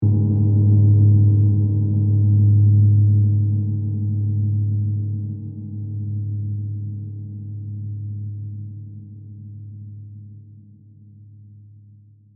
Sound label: Church Bell Dull, Horror, Church, Bell, Dull, Metallic, Dark, Ring; Scary Tones; BlastWave